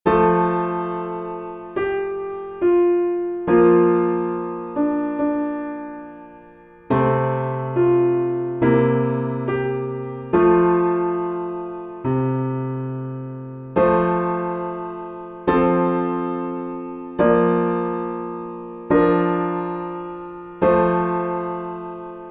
piano backing, hymns; Nearer My God to Thee

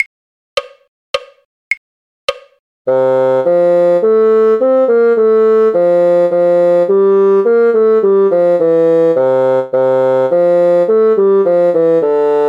bassoon; the ash grove; folk

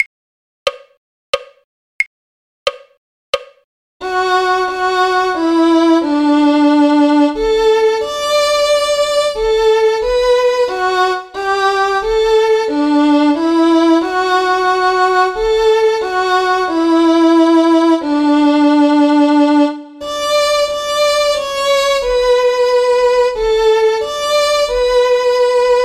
violin; carols

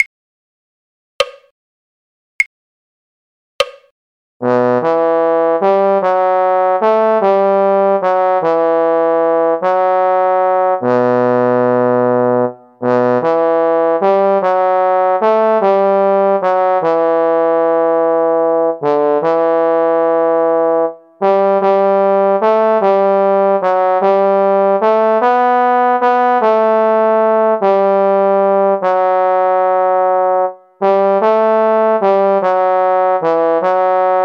trombone; carols

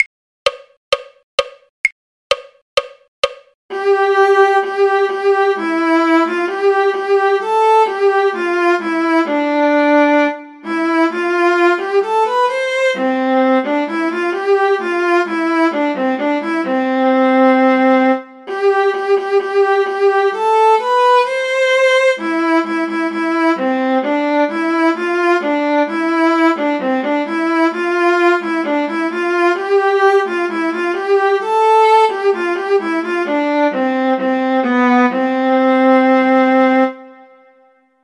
viola; all in a garden green; folk

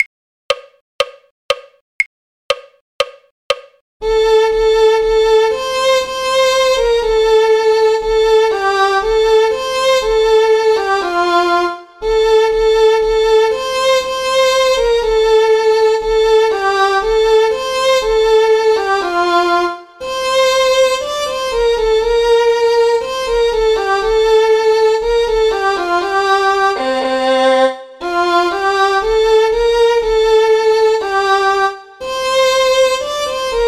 violin; carols

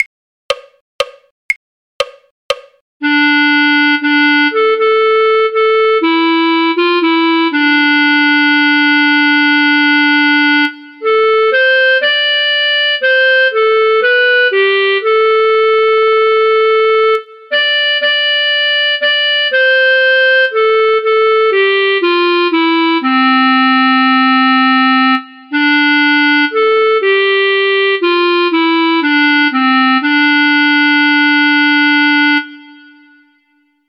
clarinet; scarborough fair; folk

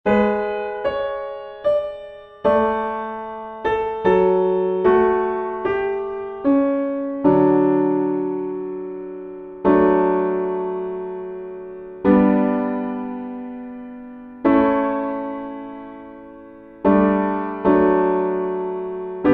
PIANO BACKING; hymns; Great Is Thy Faithfulness

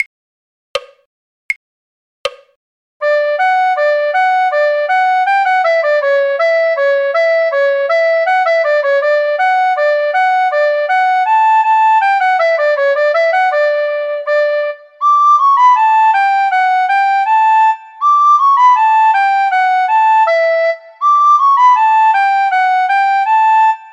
recorder; the galway piper; folk

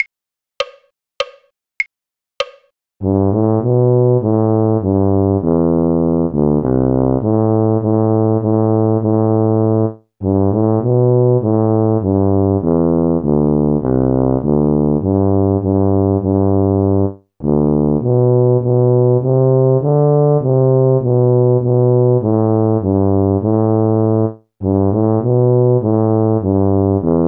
tuba; carols

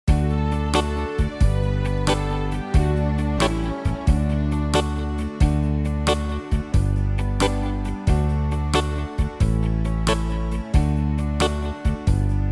backing; folk

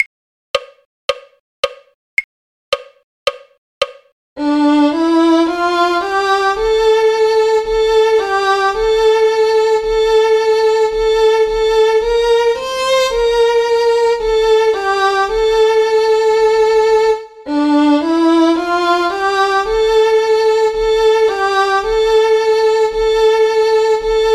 violin; carols

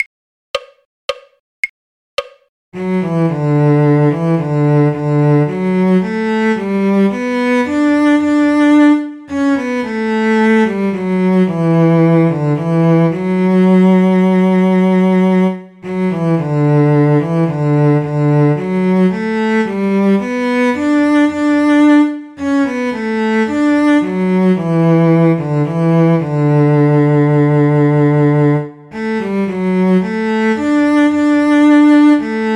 cello; believe me if all those endearing young charms; folk

